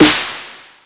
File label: Snare, Dubstep One-Shots Drums; Ghosthack; AC2024 - Day 13 - Dubstep Sounds 2025; Grayness